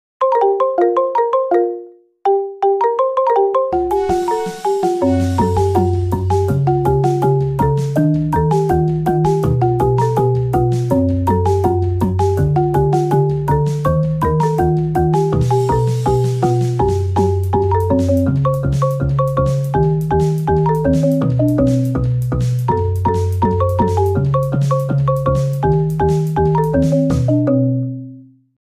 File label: marimba